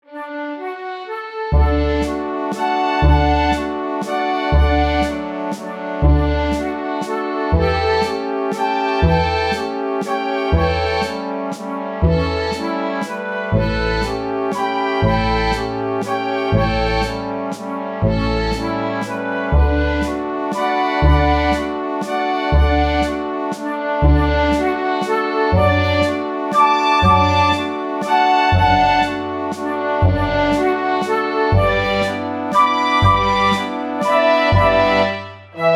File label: violin